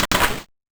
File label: Sound Magazine Open